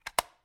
Tape

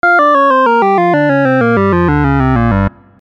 Logo Open; ultimate vhs pack; Videohive

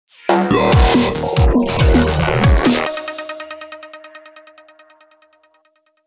Dubstep Logo

